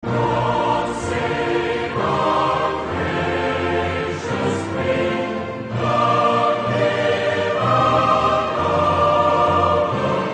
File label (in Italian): Inno Inglese